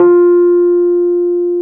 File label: PNO, Electrics, Rhodes